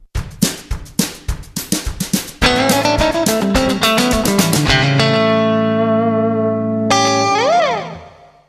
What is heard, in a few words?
country, scales